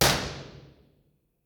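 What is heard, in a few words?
impulse response stereo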